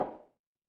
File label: impact wood knock